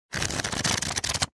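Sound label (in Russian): просмотр как колоды карт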